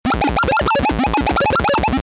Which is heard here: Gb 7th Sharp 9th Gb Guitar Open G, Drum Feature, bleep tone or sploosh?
bleep tone